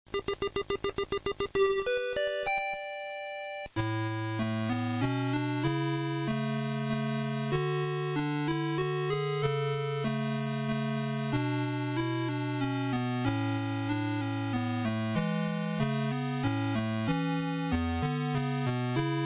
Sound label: Menuet